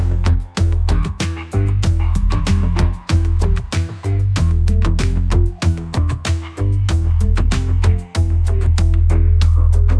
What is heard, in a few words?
escaping prison; musiclm